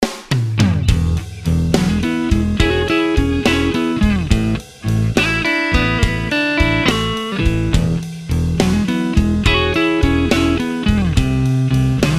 Experienced Clean, Electro-Voice EVM12L Black Label